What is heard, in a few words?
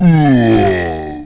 troll big die; wesnoth